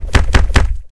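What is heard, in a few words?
Giant Buff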